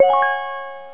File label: Winline; Twingo